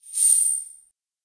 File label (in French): chaine + echo